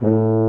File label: A2 TUBA